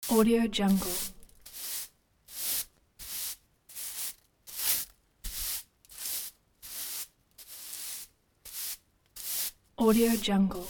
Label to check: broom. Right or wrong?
Right